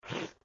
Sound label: Suck; Lewd SFX